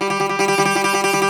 Greek Bazouki, Plucked Strings

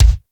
BD, DrumBase, KITS; Crypton - DrumBase5000; BABY KITS

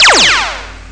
rayguns; Amiga Soundtracker Sample Packs

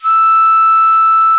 HARMO E5, HARMONICA; AKAI S-Series CD-ROM Sound Library VOL-7

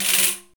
PRC ET PAN, ETHNIC PERC; Roland - LA Composer vol. 1

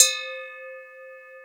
AGOGO BELLS C3

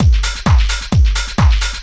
techno, DRUMLOOPS

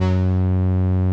505, String Machines

